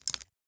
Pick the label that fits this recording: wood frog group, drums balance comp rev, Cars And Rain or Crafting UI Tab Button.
Crafting UI Tab Button